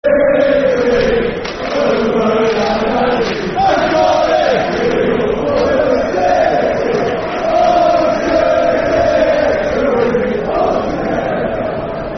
We All Follow The City, Football Chant (Soccer Song); Bristol City Bcfc